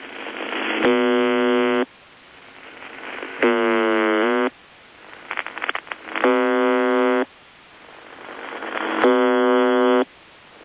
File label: buzzer usb